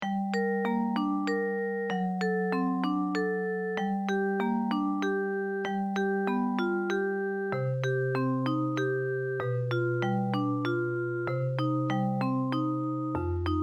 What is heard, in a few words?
elegant waltz